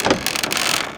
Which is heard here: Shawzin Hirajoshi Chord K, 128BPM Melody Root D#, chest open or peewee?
chest open